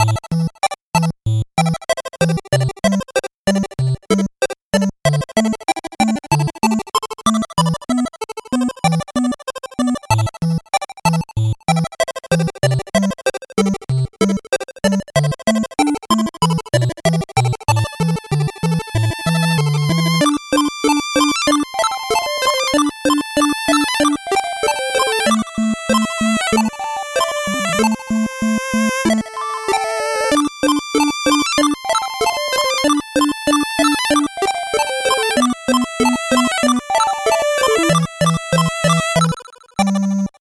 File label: 8bit bgm slow